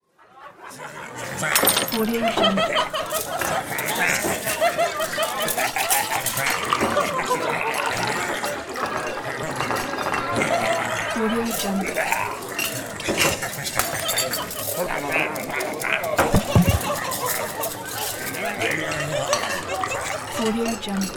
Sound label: pirate tavern ambience